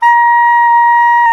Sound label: SAX SOP MF A, Soprano Sh; Roland LCDP07 Super Sax